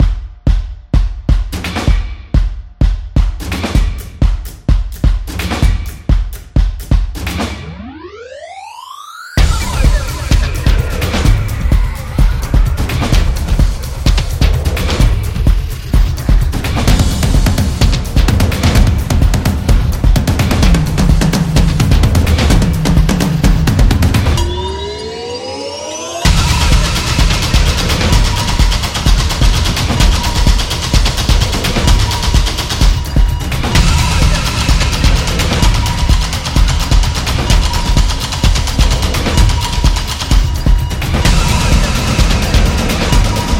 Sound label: The Percussion Energetic